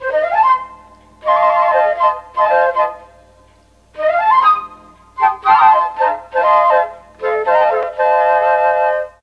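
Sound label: D4 CHA CHA FLUTE, mellotron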